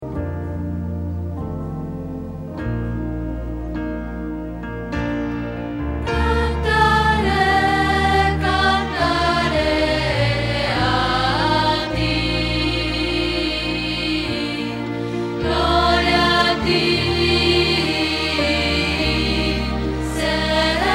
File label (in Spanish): Contralto